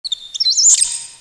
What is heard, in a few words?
rat alert